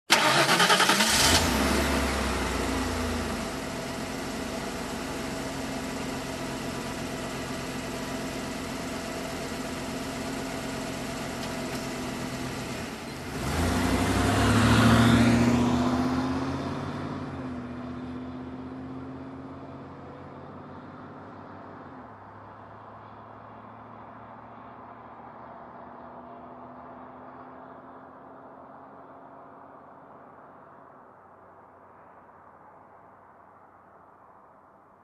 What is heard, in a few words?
Car engine ignition and drive away